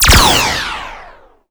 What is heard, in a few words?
Fire Ghost Energy